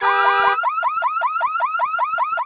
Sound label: CAR ALRM4, residential; zones